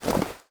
shove

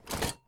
armor

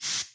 spray

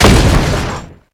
thud

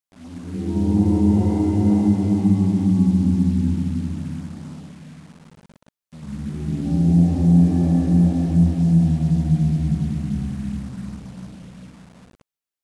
idle, weapons; m95; tfa cso; m95 ghost

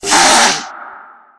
spider ouch

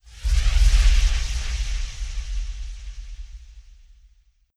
blowout flare

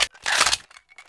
clip in; tiki

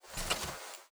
arrow fetch, bow; bow deerhunter

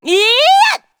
shout long; macha

